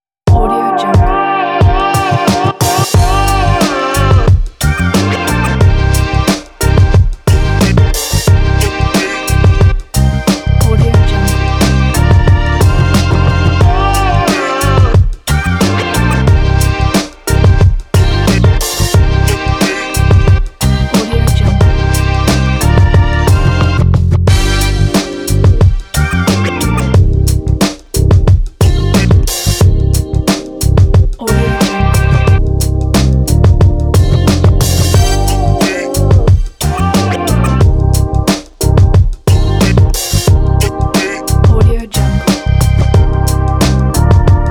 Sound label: background hip hop